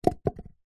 cardboard tube toilet roll drop carpet; zapsplat

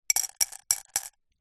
foley gemstones jewels into glass jar

